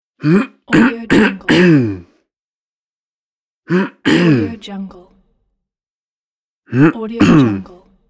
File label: clear throat male